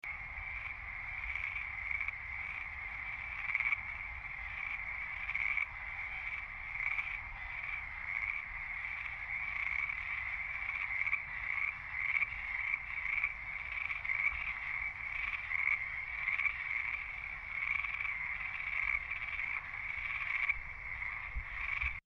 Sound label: frogs